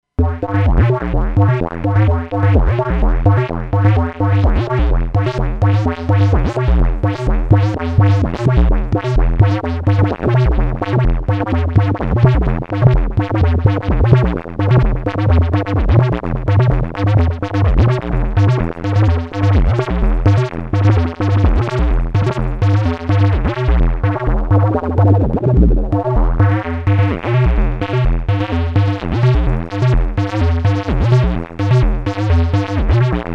Wobble FX; Acid8 MKIII

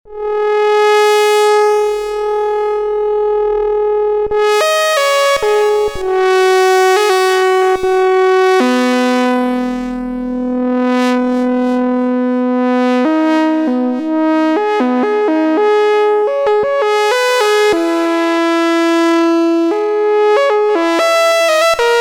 Lead

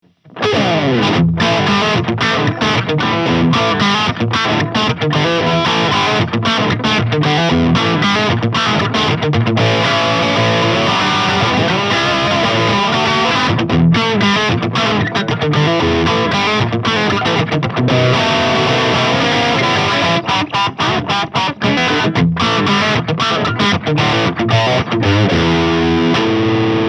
Engl E670FE Crunch

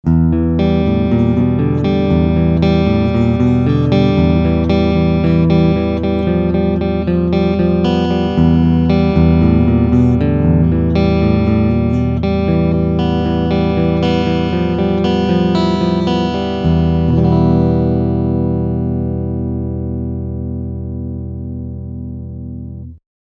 Clean Picking Front Humbuck

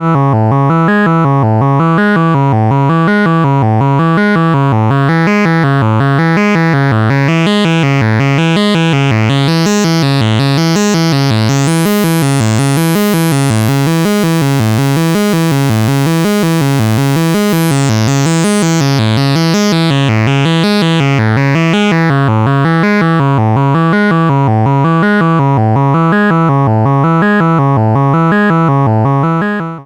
Module 24db LOW PASS FM with Sine; GRP

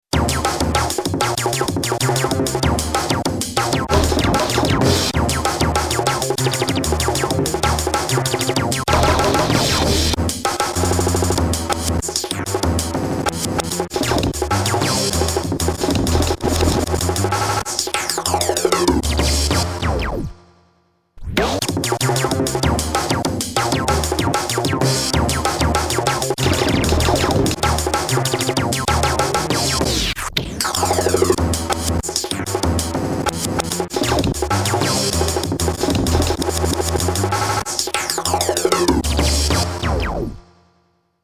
Casio XW PD1 Drum and Bass

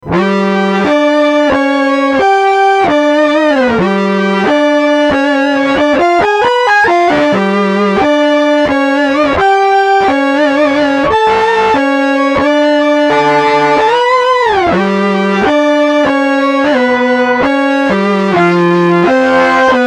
Synth Fuzz

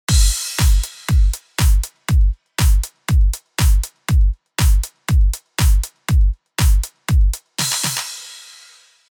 Fredenstein Artistic EQ Beat API-2520 NoEQ

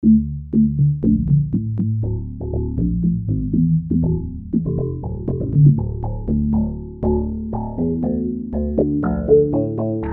Birds meet Bass Prophet VS

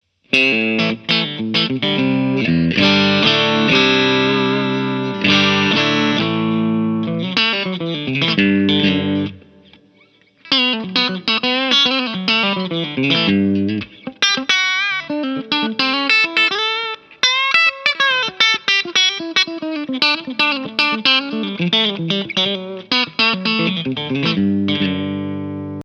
clean gain; thr; 6v6

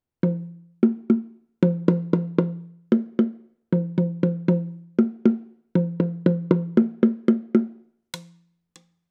Sennheiser e865s Bongo

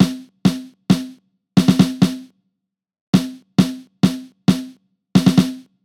TG I51 Snare Top

